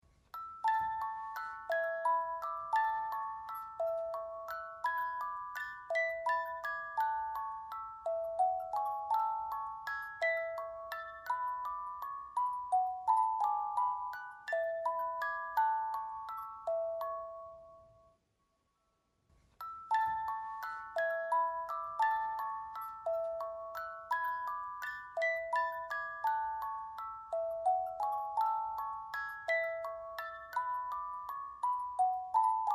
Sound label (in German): Spieluhr; Chernov